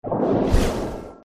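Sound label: Water Spirit attack up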